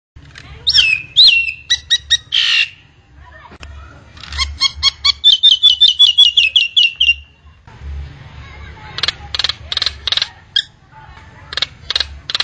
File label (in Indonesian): suara burung jalak nias pemaster